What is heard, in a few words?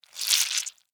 Squish Suction, Texture, Squish, Suction, Wet, Juicy; Squishes; Objects; BlastWave